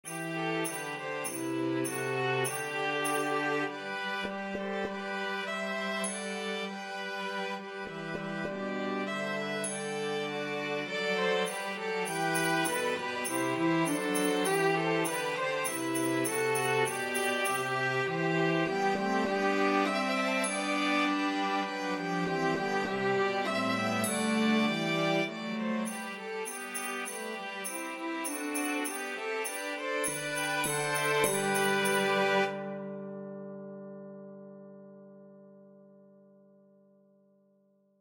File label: Violin Track with Melody; The Holly and the Ivy